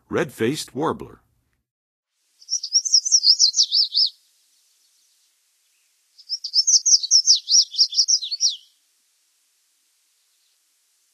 Red-faced Warbler, Songs of Birds of North America; Western USA; Western USA Songbirds